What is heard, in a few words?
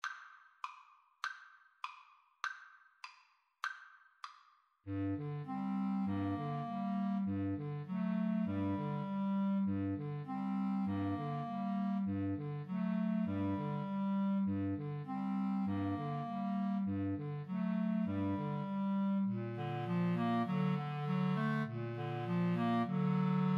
Clarinet Trio